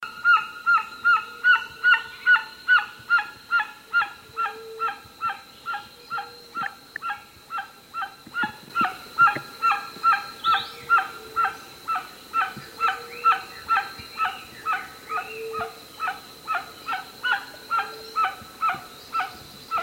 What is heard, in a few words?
White winged guan